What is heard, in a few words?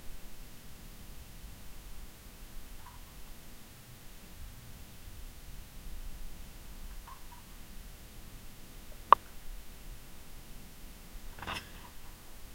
ultrasonic multi channel, science